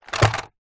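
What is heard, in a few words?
leisure radio controlled toy car large plastic set down drop carpet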